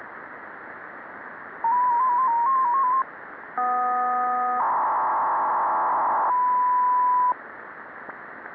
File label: PSK500 (SERIAL), AMATEUR RADIO MODE